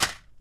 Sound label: Shake Nail Box